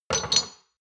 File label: shot glasses stack slide medium; soundpool